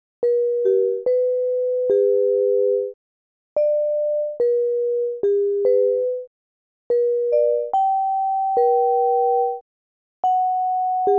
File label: 16edo; Jason Conklin; Enigmagic